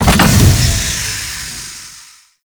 Turret Overheat; Weapons; APC; Warframe; Lasrian

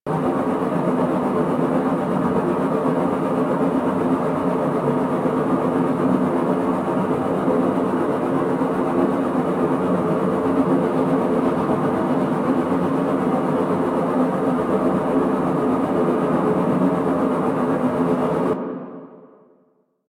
Amb, Ambience; Warframe; Hub; Tenno Junction Relay

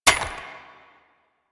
Bird Three Single Step; Warframe; Ambience; Entrati

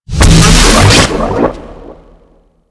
Spectre Appear; Warframe; Syndicates; Generic Powers

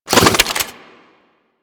Fist Spin; DE; Warframe; Weapons; Archwing; Melee; Grn Arch Regor Fists

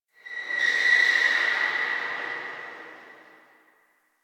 Distant Car Squeal

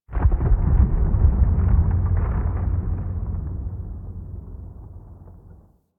Venus Landscape Glacier Ice Distant; Ambience; Warframe; Venus Open World

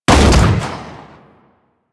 Fire Shot, Weapons; Strun

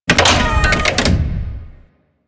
Thumper Bot Leg Damaged D; Warframe; Grineer

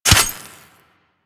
Melee Dagger Impact Robotic Flesh

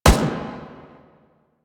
Lasrian APC Turret First Shot, Weapons; Warframe

